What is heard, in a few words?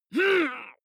male fight pain groan short; vocals; comedy-cartoon; soundpool